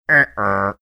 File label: imitating uh oh drop